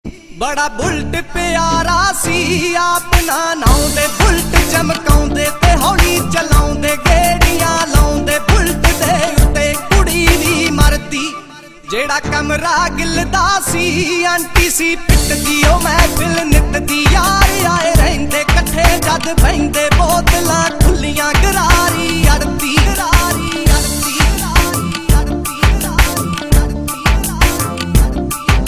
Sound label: Punjabi Hit